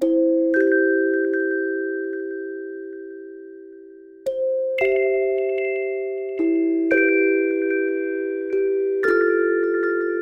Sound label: Spinning lullaby end; Among the Sleep